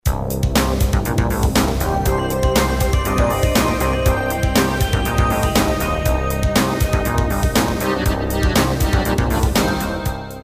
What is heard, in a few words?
Jingle; EUROrady